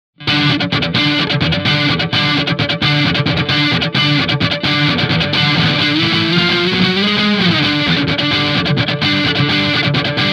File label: funk; ampvergleich